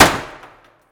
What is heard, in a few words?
Wood Impact Debris, Abandoned School; The Sound Catcher